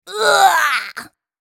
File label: Tristana dying